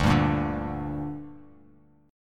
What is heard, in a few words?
D Suspended 4th Sharp 5th D down, chord; strummed